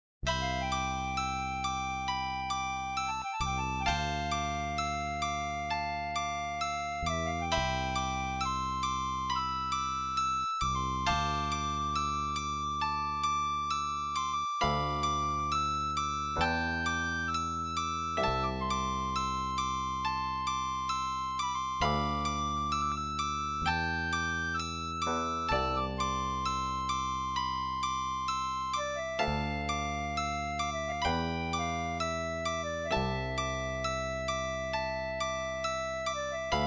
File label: Simple Oriental8 tune.